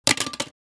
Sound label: CD or DVD single drop hard surface